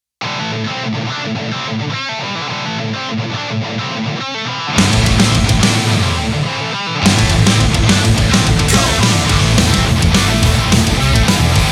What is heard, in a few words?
Precious Rock